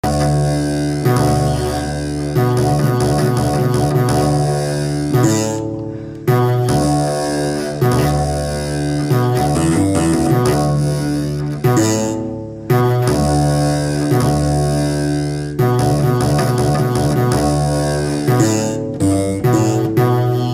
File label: begena sound